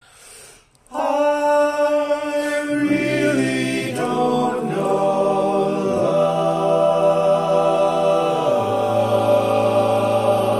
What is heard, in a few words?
Lead, barbershop